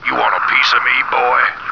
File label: pain; action-quake2; terran